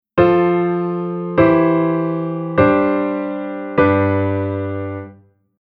Chord

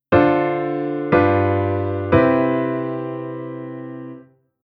Chord Sub V7; V7 Okikae

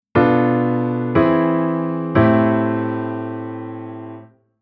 Chord aug

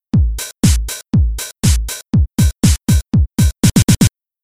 Rhythm

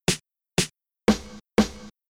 Rhythm Hip Hop SD

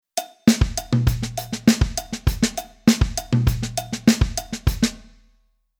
Rhythm Latin

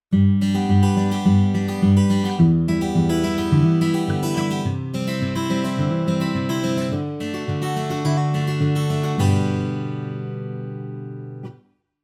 guitar

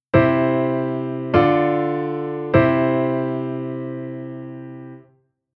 Chord Cadence

